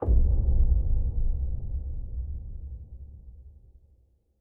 continue button; bloodsuckers2

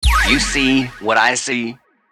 Big Win Super Mega Win; knight rider

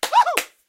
clap; irish pot luck

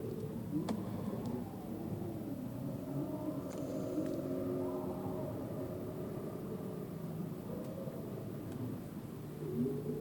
ambience General; bloodsuckers

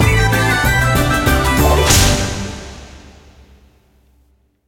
bigwin end; irishpotluck

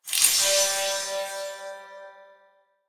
Butterfly Frenzy Pick Medium; butterfly staxx 2

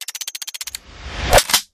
floating Wild Movement; wild wild west

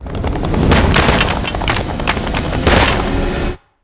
near Win; dead or alive 2

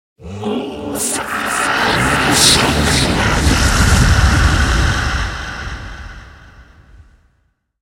dragon Voice; shangrila

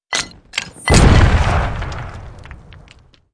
tile Explodes; lost relics

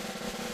snare Loop; willyshotchillies

